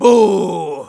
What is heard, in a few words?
jeers, de; Soldier; "Buuuh..."